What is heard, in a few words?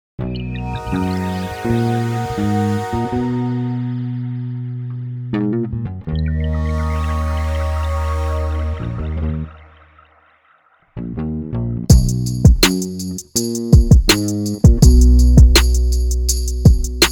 Instrumental